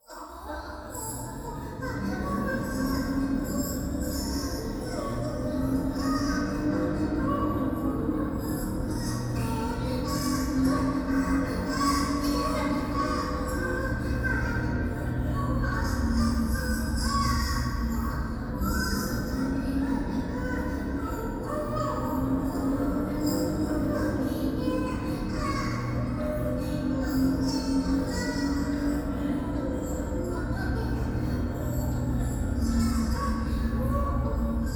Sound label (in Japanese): トイレ 低音喘ぎ 車の中